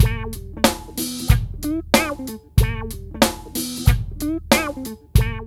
LOOP

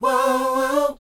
SHOO B D, R&B Dance, vocal; Spectrasonics Vocal Planet

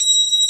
B3 C 6, ORGAN; VOCAL ORGAN

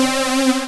RAVE, RAVESYN

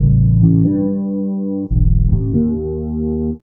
Classic Bass, Drum N Bass

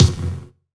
BD, BASS DRUMS; EdgeSounds

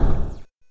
Drums, Hits; DCM8

